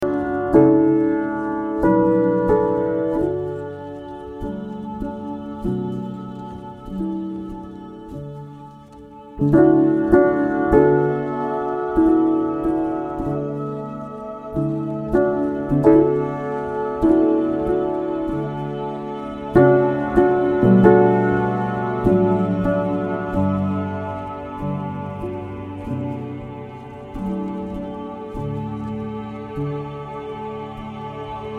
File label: piano diary